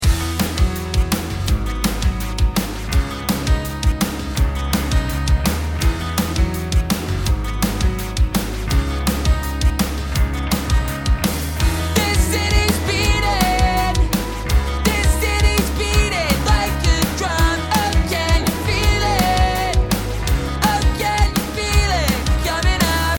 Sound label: start round, music